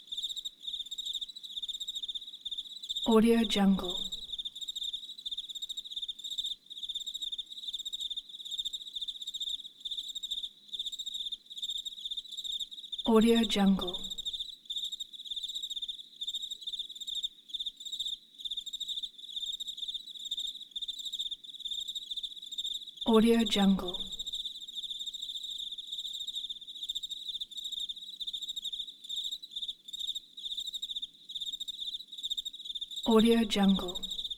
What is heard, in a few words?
crickets night